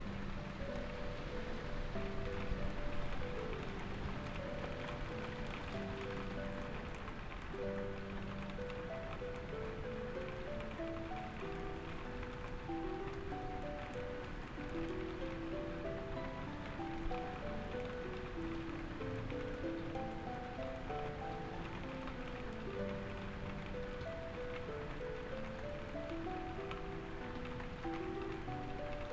環境音 雨 家の中

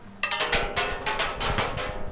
賽銭入れる

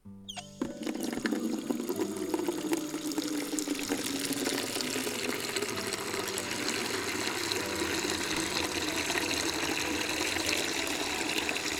SE 水 蛇口 溜める; 水溜める

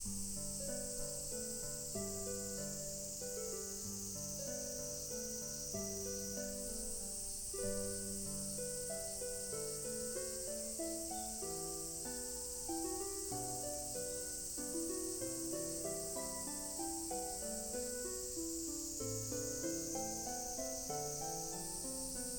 環境音 蝉の鳴き声 多数

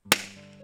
風船 割れる

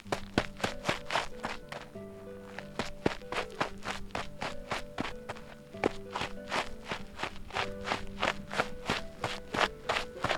足音 砂, 走る

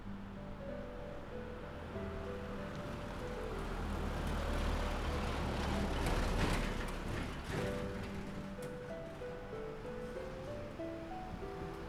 SE 遠くでトラックが走ってる; 車 トラック 通過音

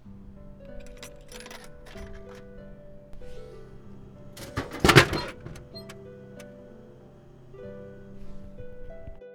SE 自動販売機, コイン入れる〜飲料出るまで

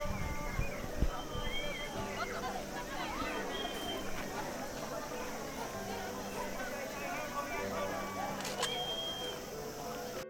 環境音 祭り 人混み

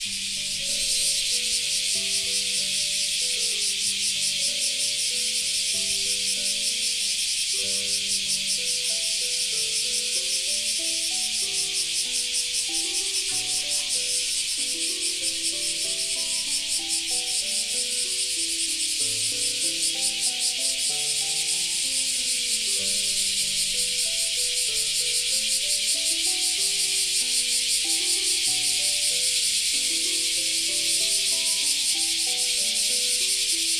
環境音 セミ 多数

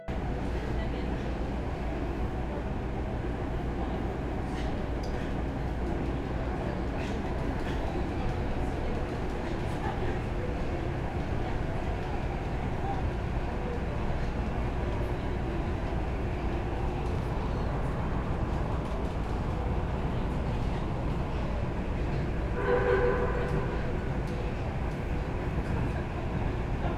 SE 環境音 電車 車内

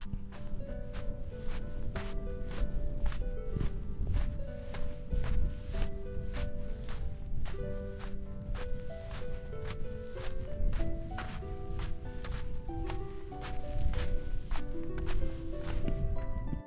砂の上を歩く, 砂浜を歩く

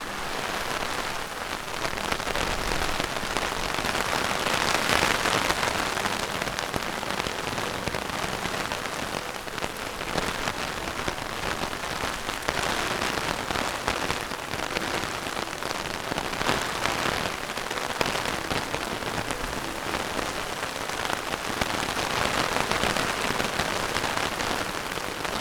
雨が傘に当たる

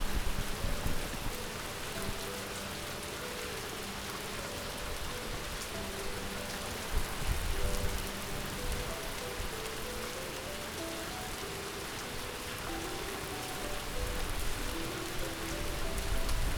雨

